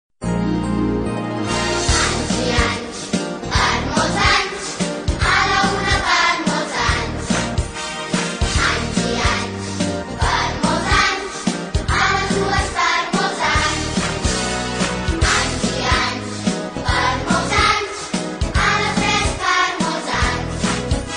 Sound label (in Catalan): moltes felicitats